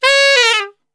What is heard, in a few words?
C# 2 SAX; Best Service; Brass Super Section